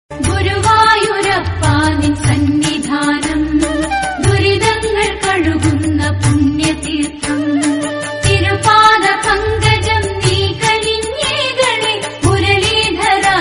malayalam devotional song

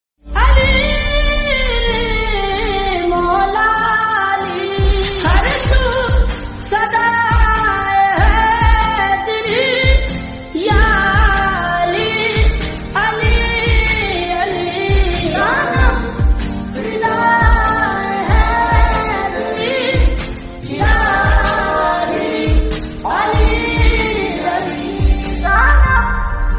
naat islamic sad

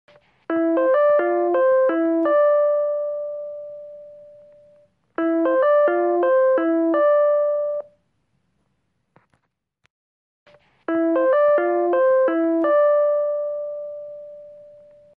incoming call

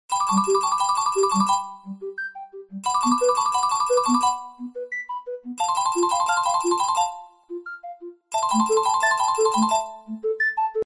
nokia n95 message

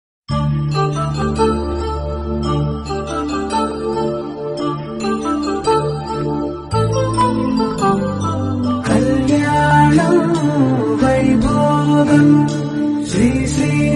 background music; srinivasa kalyanam movie

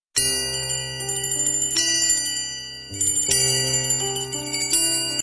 soft bell

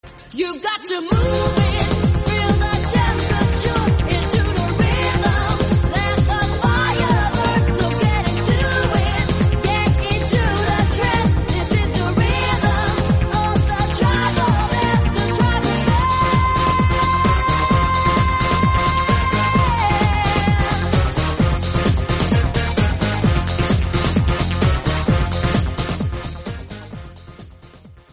tribal dance

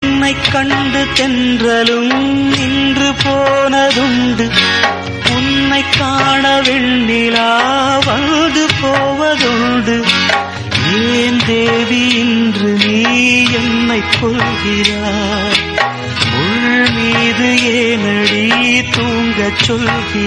tamil song

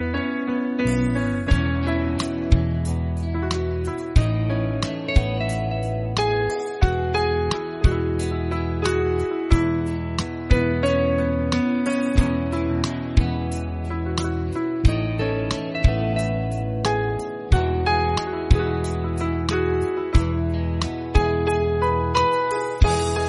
instrumental

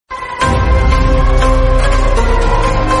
notifications; kgf

